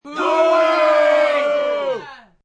Group Boo Short, Booing, group booing. 5-10 Males only, short, sports (human voice); Sound Effects:Voice; VoiceBox